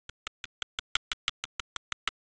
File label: calif warrning call, quail